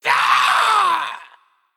Female Dragonhawk Death

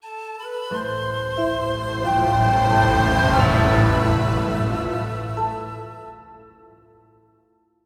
Legendary Stinger; Blademaster Samuro

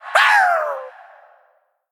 Male Beast Attack

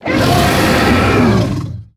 Attack

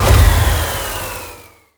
Death Underlay

